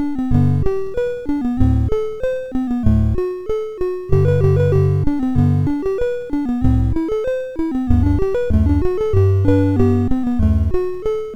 MOS6581R2, sid; Magic House

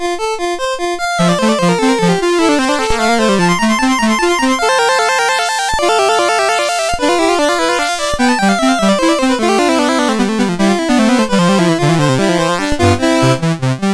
Invention in F, MOS6581R4

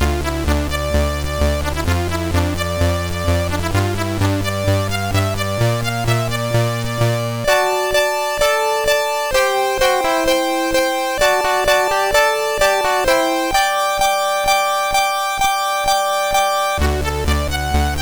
Highfly, MOS6581; MOS6581R4

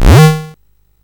Frogs and Flies, CSG8580R5

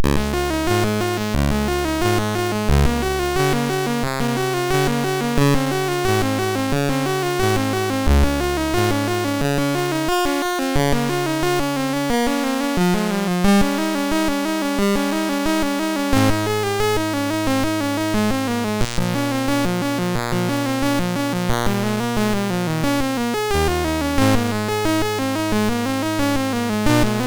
Prelude, MOS6581R2

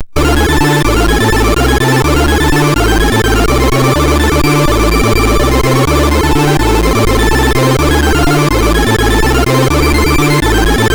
sid, MOS6581R4

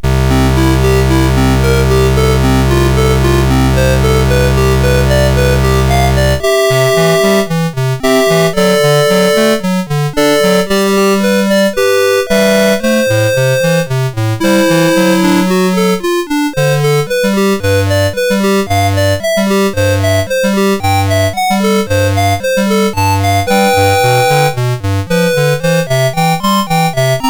Prelude in C Major, MOS6581R3; Big T Cat

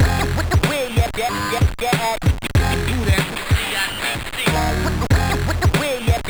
Hip-Hop Loop, sid MOS6581R3

